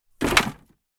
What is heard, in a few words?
foley wood peices thin throw hard onto pile